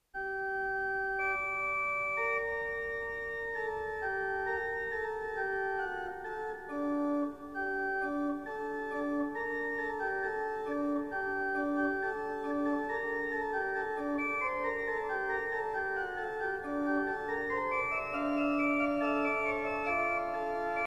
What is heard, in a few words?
organ